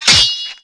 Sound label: hit; Eft; GARLIC TANK